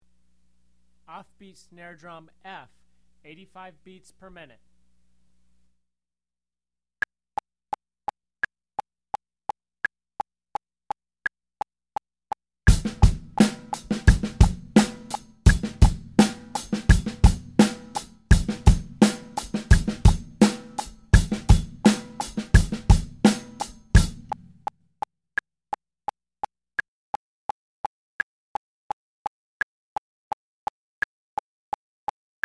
off beat snare drum; Drum Lessons

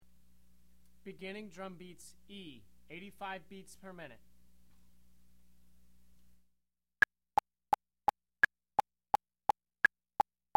beginner drum beats; Drum Lessons

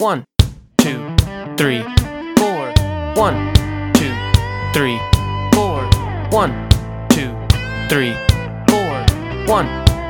Roots Rock F; Drum Lessons

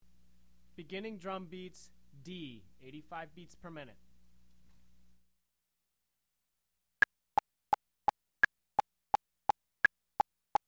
beginner drum beats; Drum Lessons

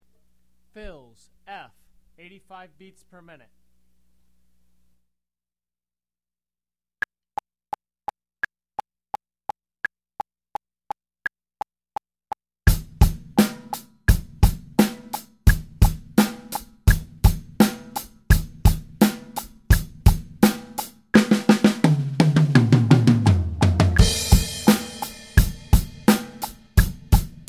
fills, Beginner Fills 85BPM; Drum Lessons

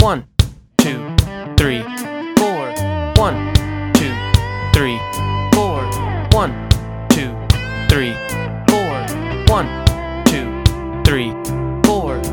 Roots Rock, play alongs; Drum Lessons

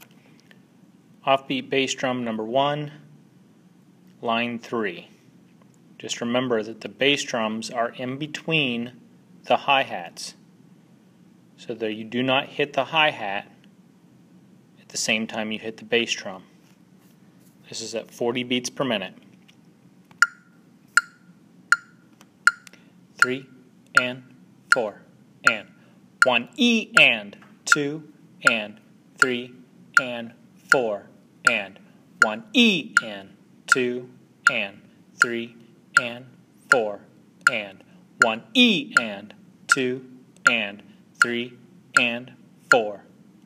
Offbeat bass drum; Drum Lessons

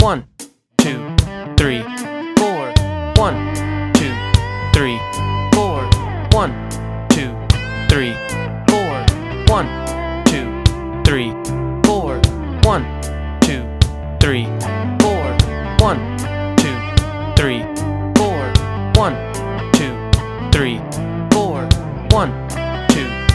Roots Rock C; Drum Lessons

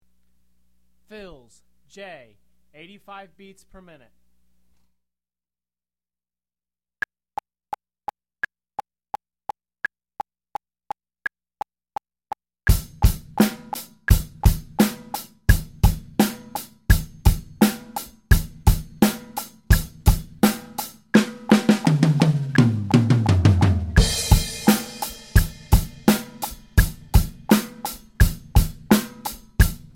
fills, Beginner Fills 85BPM; Drum Lessons

